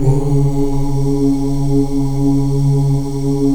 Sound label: AFROOO C#3, VOWELS, Heart of Africa; Spectrasonics; Heart of Africa 1